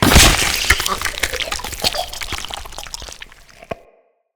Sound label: Rip In Half, Flesh; Impacts